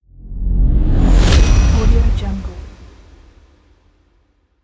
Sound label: powerful hit transition